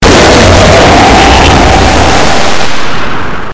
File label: roar; oblivion